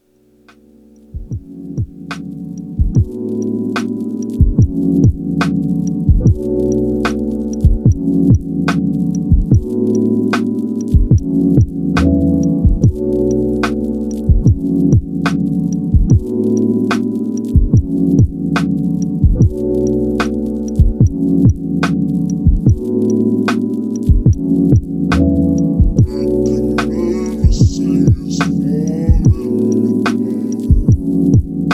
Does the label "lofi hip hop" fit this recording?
Yes